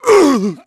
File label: DEATH, VOICE; Pulse Racer